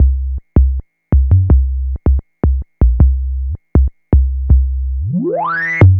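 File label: synth bass, drum and bass, 160 bpm, C; eJay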